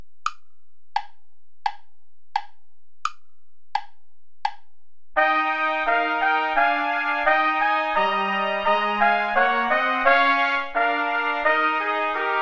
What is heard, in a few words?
play along without trumpet